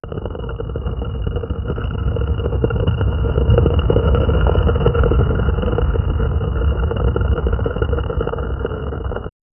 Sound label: realistic sound effect of, stone grinding